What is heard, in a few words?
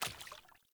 Sandals Walk Water, Footsteps; Characters; Player